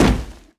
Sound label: Drone